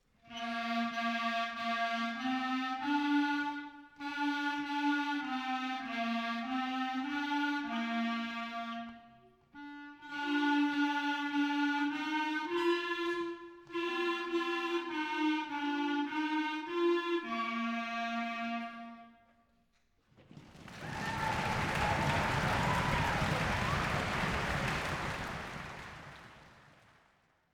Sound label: Clarinets